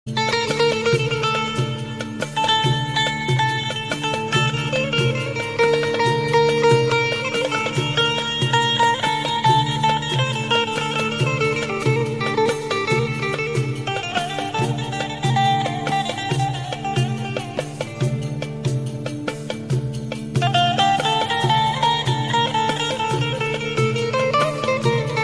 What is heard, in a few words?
Turkish Saz